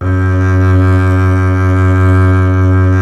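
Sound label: string contrabass F0; Miroslav Vitous - Solo Instruments VOL-2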